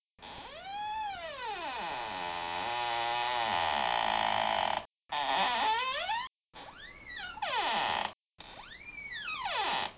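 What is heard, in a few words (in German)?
Türquietschen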